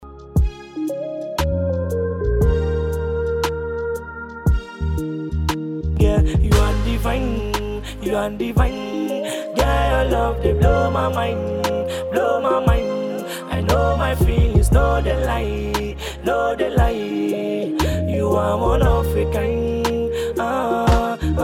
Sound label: Instrumental